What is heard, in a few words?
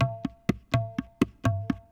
PERCLOOP ETHNO 125; eJay